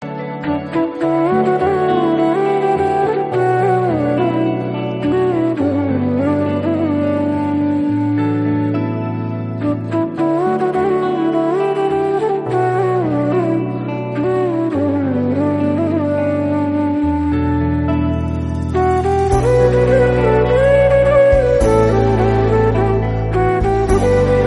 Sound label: Music